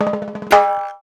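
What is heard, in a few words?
Cha Cha Cha Fills; Spectrasonics; Supreme Beats; African Contemporary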